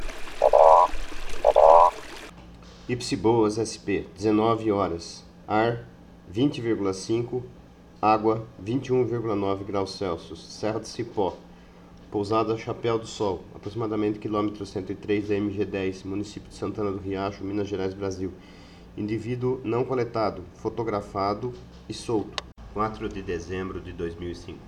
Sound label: Boana crepitans